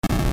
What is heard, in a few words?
close big near; ff3; ff3us